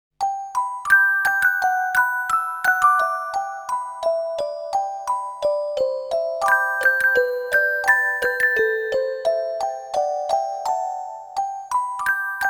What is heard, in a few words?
[orgel arrange ver.]